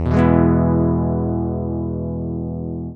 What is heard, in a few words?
chord